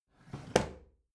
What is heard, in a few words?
bathroom drawer open regular